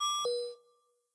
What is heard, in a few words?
UI Error Neg; blue archive